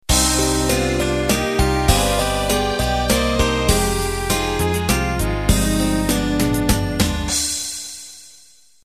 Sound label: Waltz Intro